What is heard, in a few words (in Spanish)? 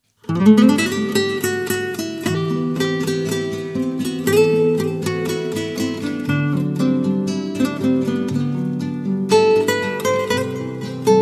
Guitara